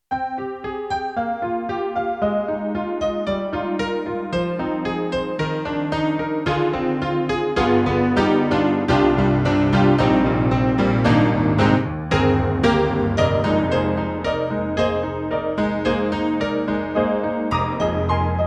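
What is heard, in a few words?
EDIROL SD-80, Stereo Honky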